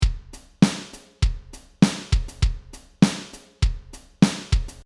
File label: Ska 100bpm